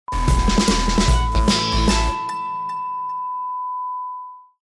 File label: Jingle